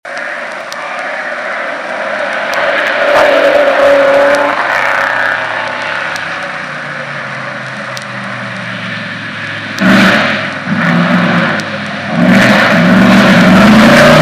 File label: Mercedes